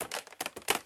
Object Dropping Hit 3 Times

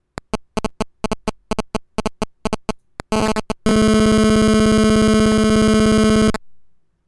Gsm Signal

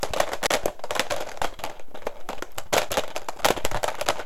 Dvd drop

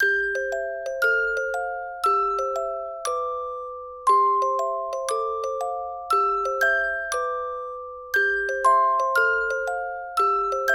Christmas Loop

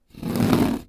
Scrape

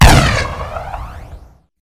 Shot

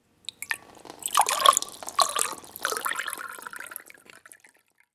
Water In Glass Spill

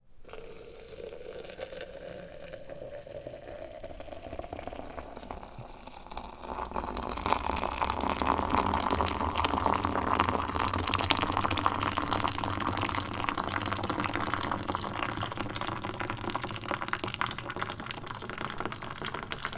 Pouring Coffee Close

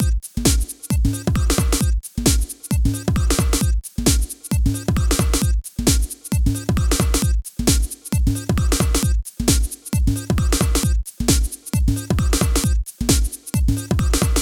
Marching Cartoon Loop

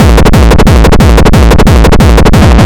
Ultrabeat

